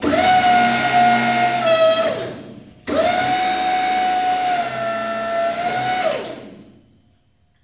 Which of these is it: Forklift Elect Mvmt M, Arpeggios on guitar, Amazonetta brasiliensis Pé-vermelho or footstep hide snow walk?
Forklift Elect Mvmt M